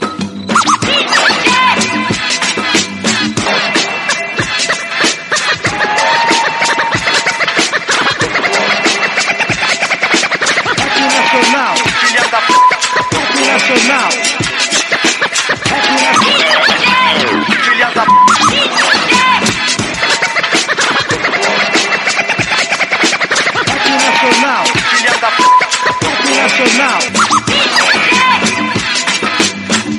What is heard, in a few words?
Scratch